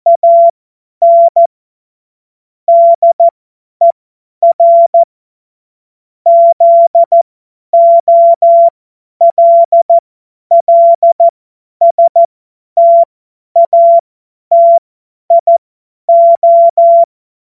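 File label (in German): Witz, Morsekurs, cw morsen; Witze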